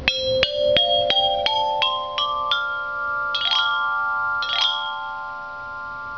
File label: brass pipe tube chime